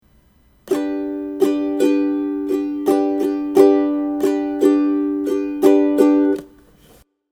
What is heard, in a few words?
Main Strum, ukulele